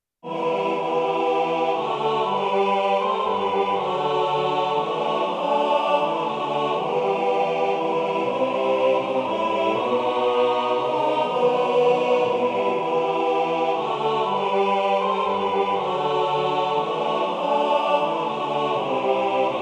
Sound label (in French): Greensleeves tutti, Chants